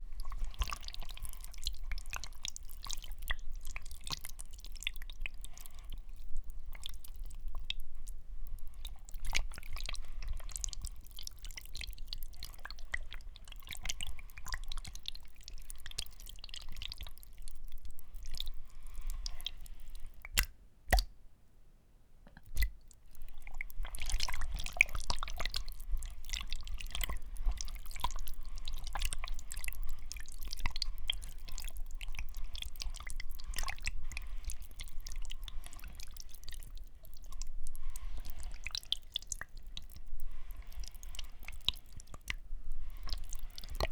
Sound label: Livers Plastic